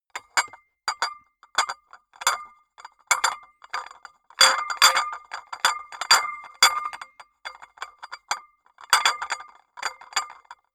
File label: dishes cups rattle jingle; atmosphaeren umwelt; gastronomie